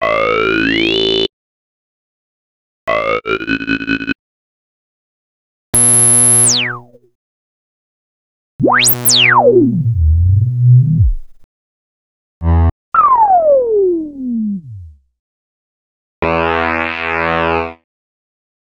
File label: Tones; X-Static Goldmine 5